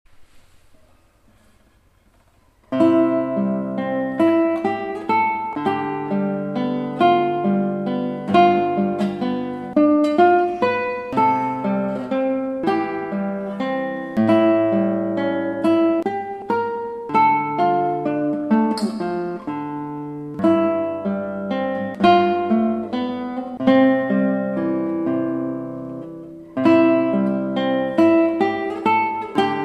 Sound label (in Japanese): ヘンツェのノクターン, ギター; ヘンツェのノクターン、マリンで